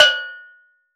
Steel Drums Layer D5, Calypso Steel Drum; Percussion

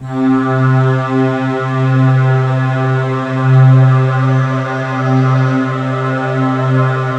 3D Keys

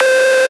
Synths Root C

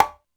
Conga Slap, Alesis D4 drum machine

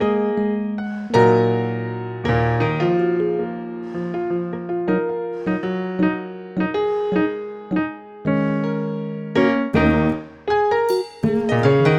Melody, Hip-Hop

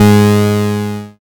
BAS MKS-50, Roland; Sound & Vision - Gigapack II CD 1

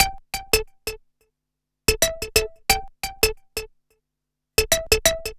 SYNT, funk

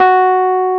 Rhodes F4#; Best Service Gigapack 1

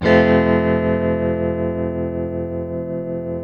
TELE CHORDS MAJ F#, Guitars; Spectrasonics; Hans Zimmer Guitars VOL-1

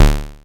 dry perc, Quasimidi Rave-o-lution 309

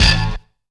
GLASS STAB; Zero-G; Funky Elements

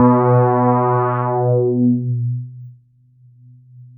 bass, synths

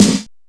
SP1200, DRUMMACHINES

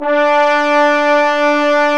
BRS BONES, Orch Ensembl; Roland L-CD702

